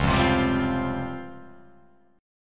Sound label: C sharp Major 7th Flat 5th, chord